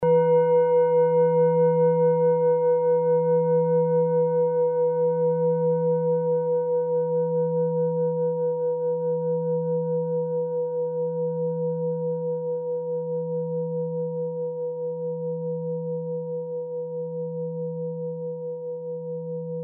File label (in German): klangschale ladakh